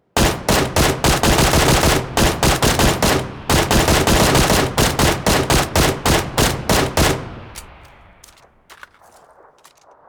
QBZ95 single fire

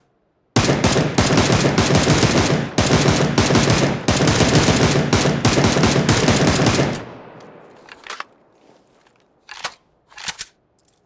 Beryl single fire

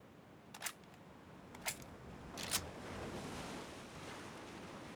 Groza reload

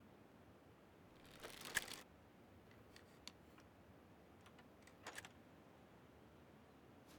Crossbow reload

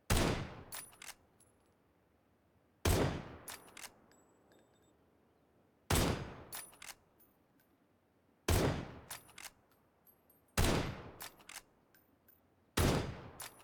Win94 shots

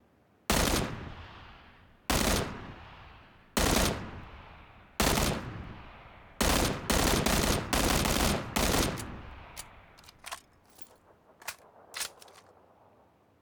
M16 burst fire

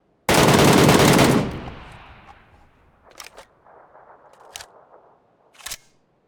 Mk14 EBR full auto

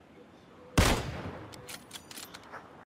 Kar shot

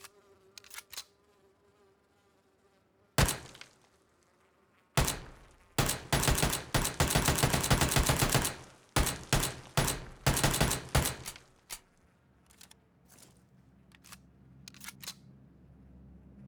Uzi single fire

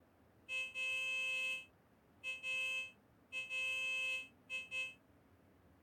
Van honk

